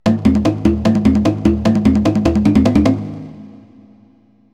Bongo Blast; Donkey's Bongo Blast; Donkey Kong 64

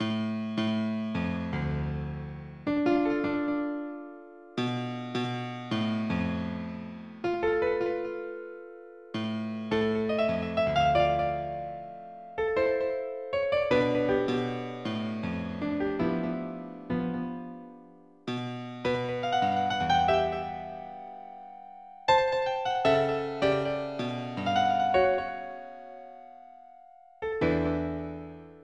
Piano Solo; Evan's Piano Solo; Legend of Zelda, The : Majora's Mask

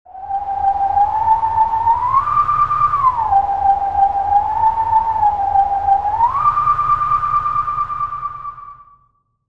Howling Stone - (Howling Stone); Legend of Zelda, The : Twilight Princess

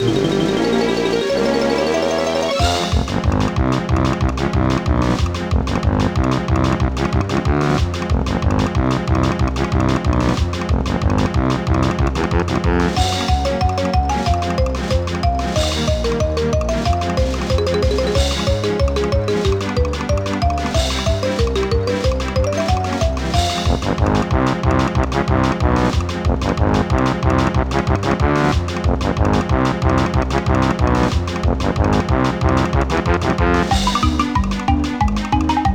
Boom Box; Boss; Nintendo 64; Banjo-Kazooie

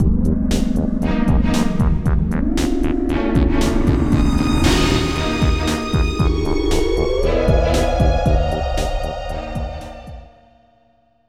Escape Ending (Hover Bike); Nintendo 64; Perfect Dark

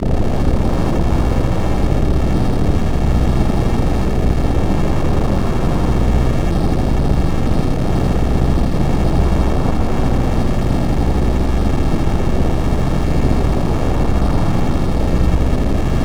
Earthquake; Super Nintendo; Chrono Trigger

